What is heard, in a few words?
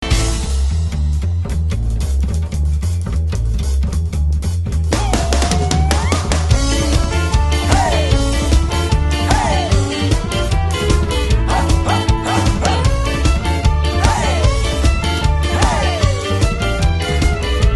swing